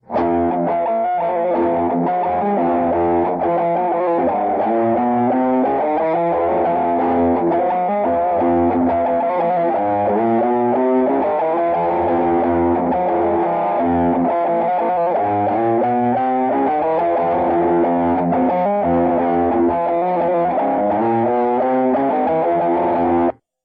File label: Riff Tapes